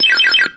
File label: beep